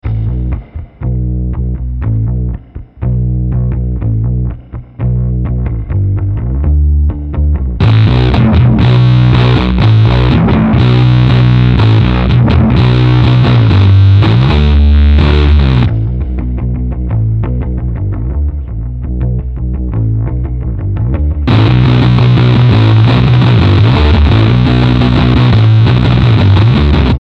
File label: heavy sludge; apocalypse